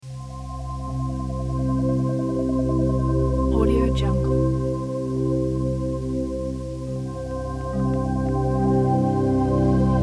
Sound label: ambient atmospheres soundscapes